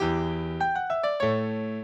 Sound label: minuet, mozart